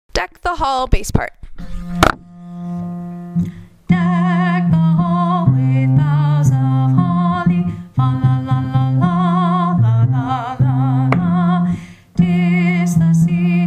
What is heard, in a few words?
deck the hall bass